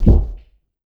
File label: rush; Spectrasonics; Liquid Grooves; RUSH SIGN